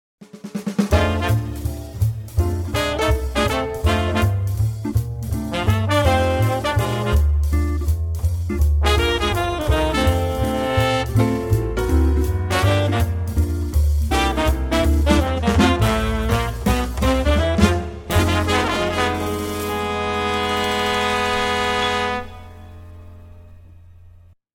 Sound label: jazz wrap